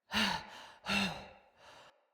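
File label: Cry Heavy Walk Female; Battle Barks; Envoy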